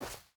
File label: footstep burlap snow run; Rust